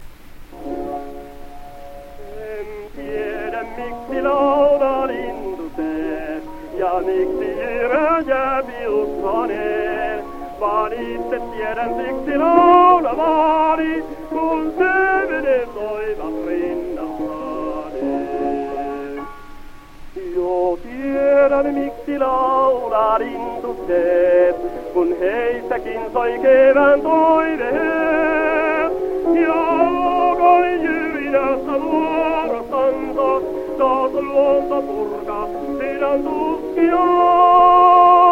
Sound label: Gramophone